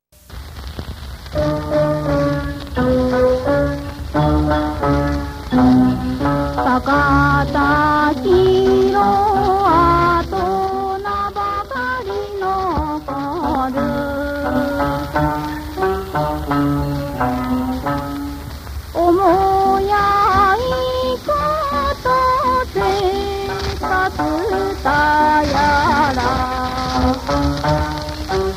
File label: uta kouta female, female